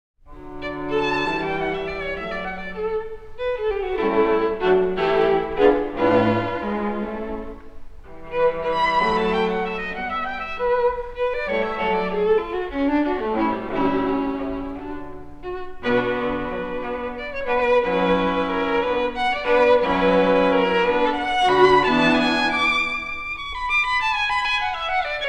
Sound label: String Trio; Beethoven; String Trio No3 Op9-2; Pasquier Trio